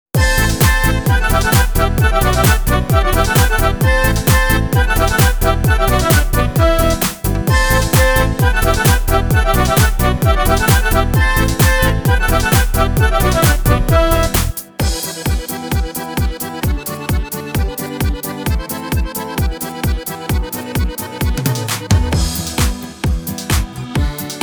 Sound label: Mazureczka Disco